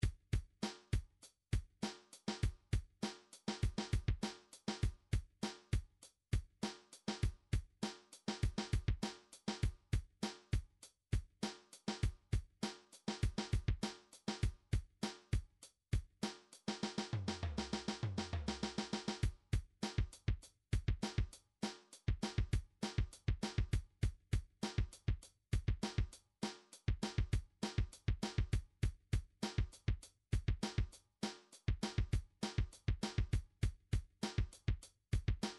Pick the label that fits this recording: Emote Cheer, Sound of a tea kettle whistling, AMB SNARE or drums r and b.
drums r and b